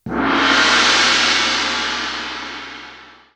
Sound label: gong win; game rip; lego ninjago rise of the snakes